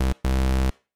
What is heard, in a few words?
beep